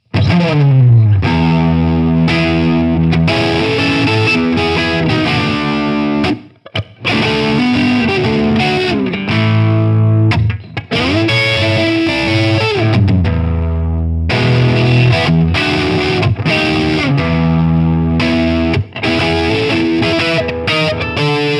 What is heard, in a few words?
Humbucker Neck; Carl Martin Panama